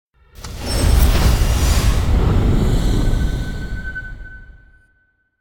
Stinger Impact Into Whoosh Heavy Trailer Beginning; Hollywood Edge; sound designer's toolkit v2